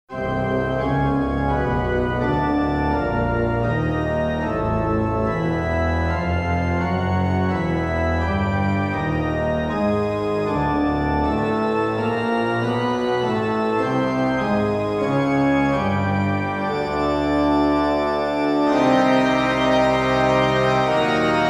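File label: Organ Overture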